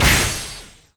Player Pain Damage

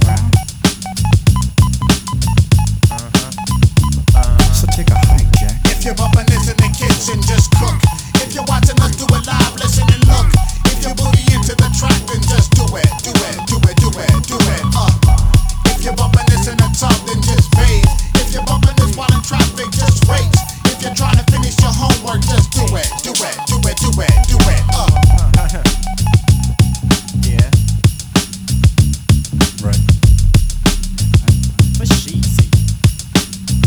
Ditty; NBA Inside Drive 2003; Xbox

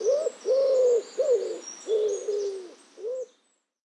Ambience Menu Pigeons; subway surfers city sound effects; Android, iOS

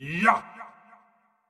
Wind Up Short; Marv

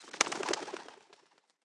Ambience Menu Pigeon Wings

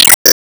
Bounce Ball Hit

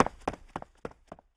Run Go One

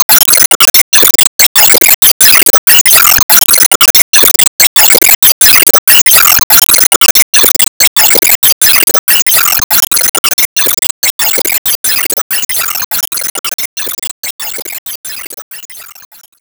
Enemy Gear Active Loop

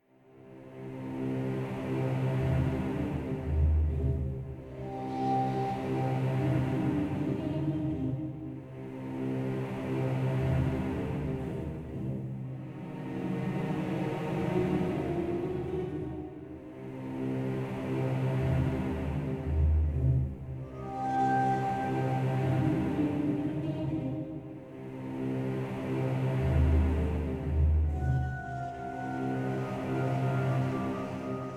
dialogue suspense

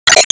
Enemy Stamper Fall

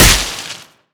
Tree SFX KO

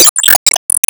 Enemy Delete; disney star smash android ios mobile gamerip 2020

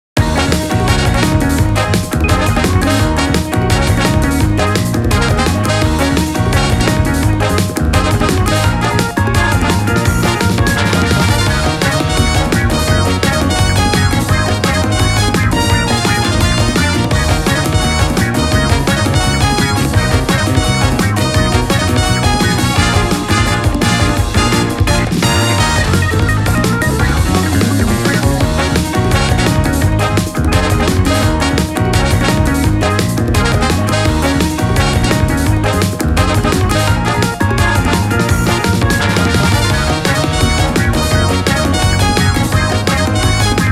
Funny beat; Legeclo Legend Clover 1st Anniversary Soundtrack Box

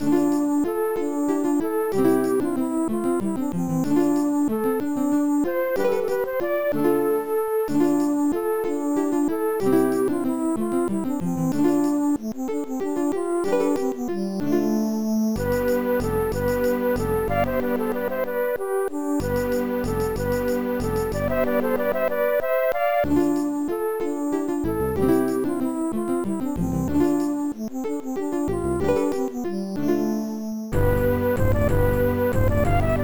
tune; Castles II Siege Conquest; CD32; Amiga

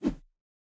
Swing Large

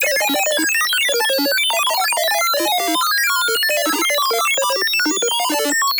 Glitch Broken computer; exciplex sample presets pack; JinoBeats

